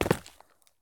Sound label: landing asphalt; Escape from Tarkov